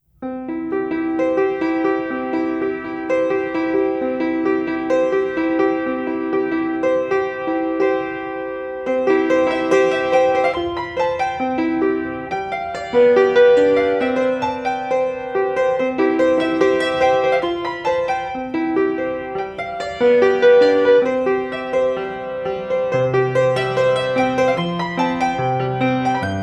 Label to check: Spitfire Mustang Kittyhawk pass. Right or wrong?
Wrong